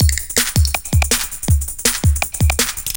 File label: breaks 162